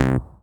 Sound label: Dance Mega Synth; Best Service; 90 s Sample CDs